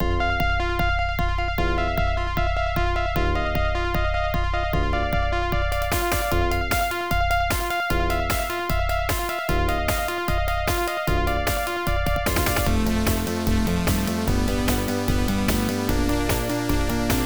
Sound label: Naming High score entry; Marble Cooking; MS-DOS